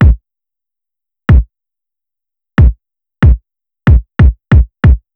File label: E-Coast HipHop Kick; Drums; Pro Tools Essential Loops; Digidesign